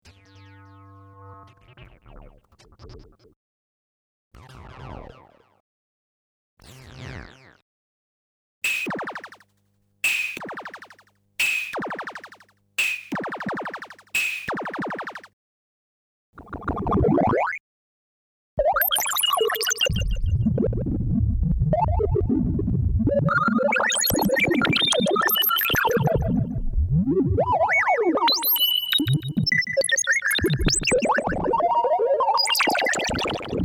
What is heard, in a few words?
Kaossilator